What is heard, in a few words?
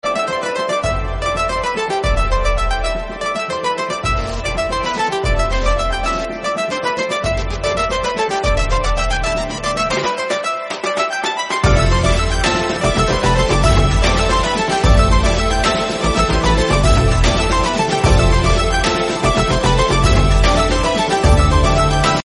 theme